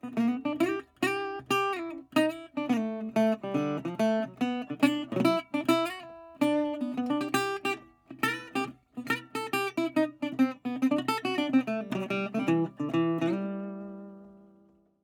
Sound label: bumper guitar